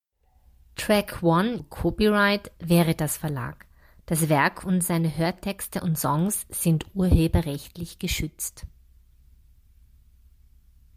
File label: Pad Track